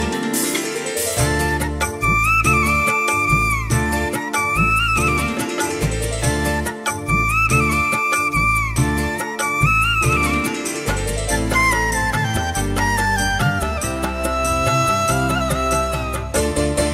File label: telugu christian ringtone, christian gospel ringtones